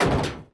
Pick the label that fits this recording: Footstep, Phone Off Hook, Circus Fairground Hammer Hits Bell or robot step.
robot step